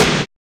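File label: ARTY SNARE; Drums In Your FACE!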